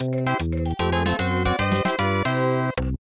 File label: Game Over